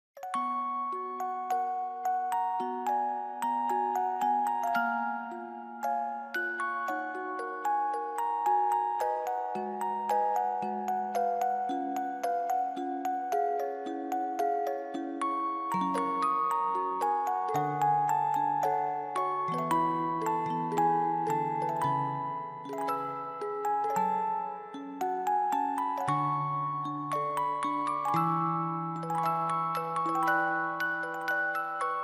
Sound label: music box ver.